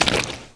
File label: fs fm paint, footsteps; Portal 2